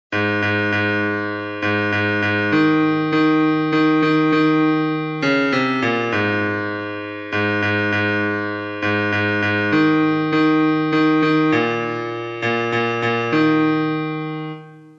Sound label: Bass